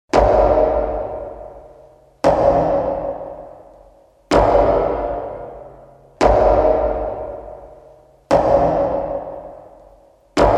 kroky obra

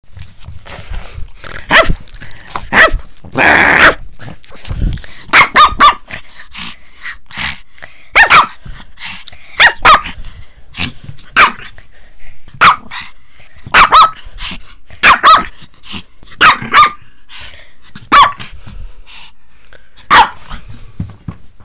Stekot malypes; zvuk tkn psa - mal pes; sound mp3-small dogs barking; Zvuky ps - sounds of dogs; Reln zvuky hlas zvat - real sounds of animals voices

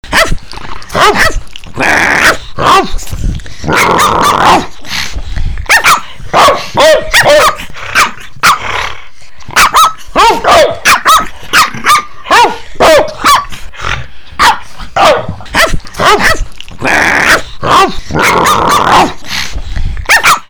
Stekot smecka psu

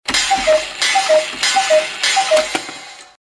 kukacka hodiny

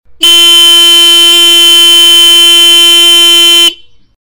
klakson motorky

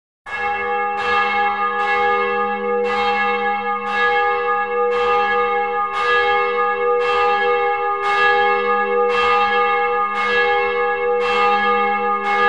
zvony

